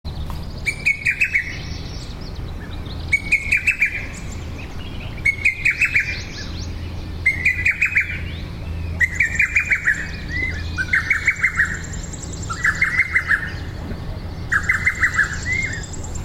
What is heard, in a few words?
cuckoo lesser Cuculus poliocephalus